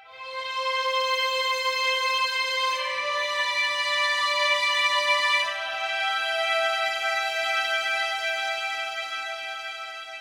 Smooth Violins; струнные инструменты

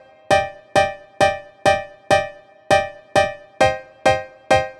клавишных funk; сэмпл клавишных

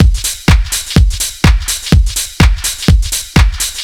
Lots Of Beat, диско-хаус

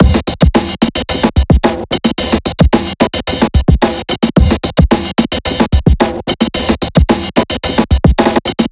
Drum Set, downbeat electronica; ударные и барабаны

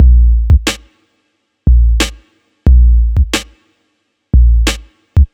Record, chillout

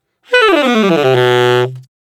Sax Solo F; саксофон

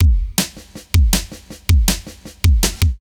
Drum N Bass Beat

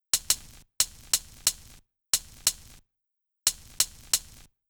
Crackling

